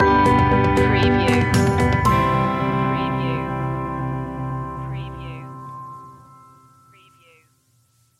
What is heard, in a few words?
travel the distance stinger